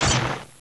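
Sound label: ZOMBIE HIT; ICEBREAK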